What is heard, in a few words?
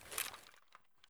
mp133 open; Boomsticks and Sharpsticks; eft wp